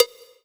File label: Roland SC-88 Cowbell